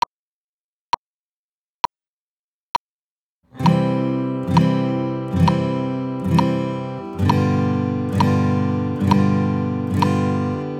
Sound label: Strum Pattern